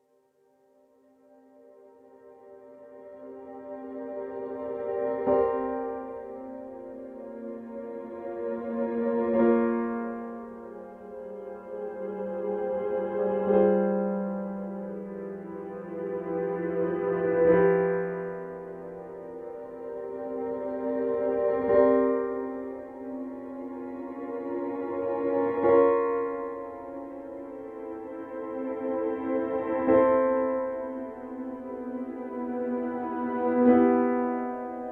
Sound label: Piano